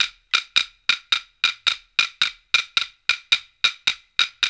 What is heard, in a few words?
STIX, World Dance; OZ!